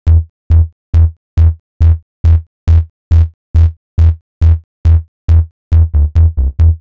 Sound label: Synth Bass; Basses